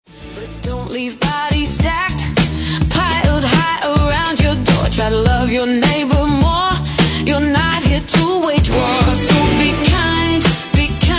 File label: Gwana Funk EDM Dance BEAT